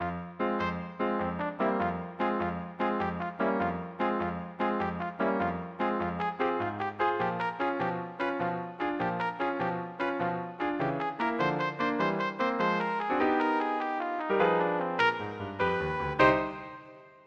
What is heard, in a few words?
trumpet